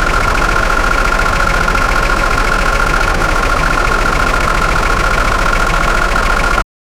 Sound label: engine idle, automobile; renault